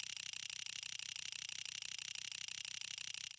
reel Spin Freespin; reel steal

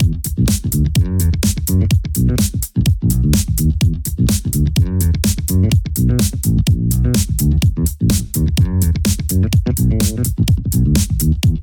badass groove; vegas night life

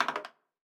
diamond, roulette; advanced-roulette

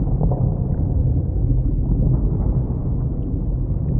reel spin

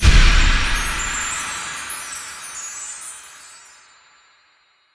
continue Button clicked; oceans treasure

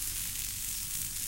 show Betline; wildotron 3000

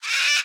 parrot win; rage of the seas

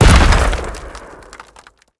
symbol explodes; hiddencoinsofegypt

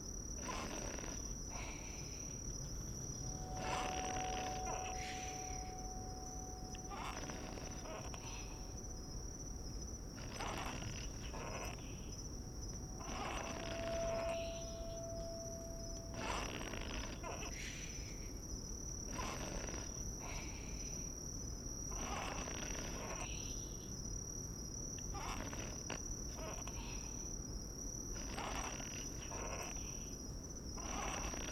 ambience Bonus Game; fairyhansel

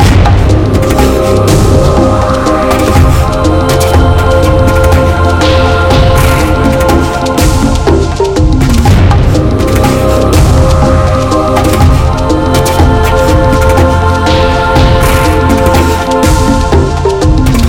bigWin Loop; gorillakingdom

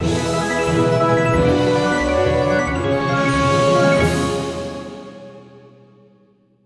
free Spin Win; jack and beanstalk

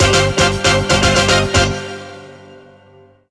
outro free spin; reel steal

